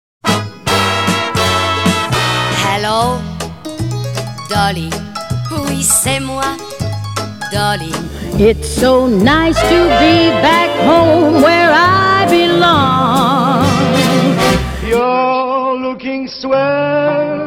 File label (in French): Chorale